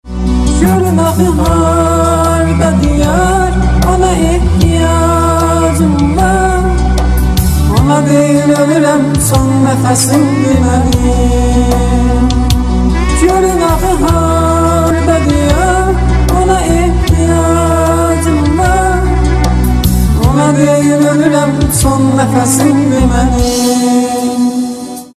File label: Ringtone Singer